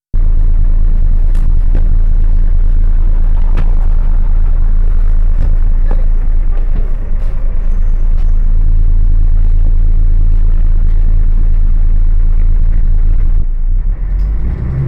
Flughafen Tegel, Im Bus; Fieldrecordings

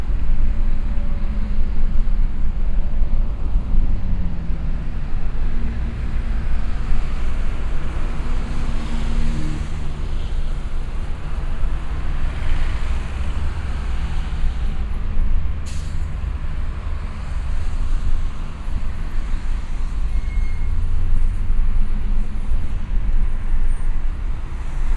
auf der strasse, Brasilien; Fieldrecordings

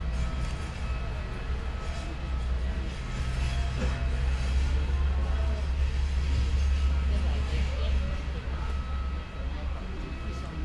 Istanbul Flughafen (Im Bus), fieldrecording

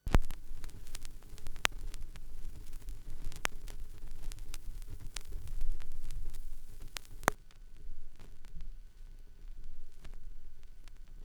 schallplattengeraeusche, Le Tibet Rituel Boudhique; CBS

